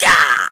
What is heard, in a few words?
Scream